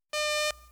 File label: beep